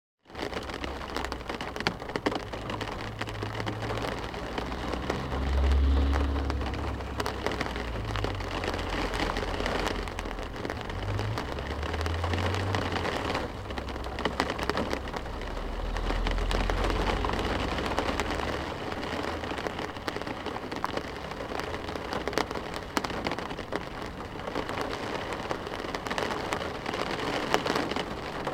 weather rain heavy and wind on car window internal cars passing outside; sound effects one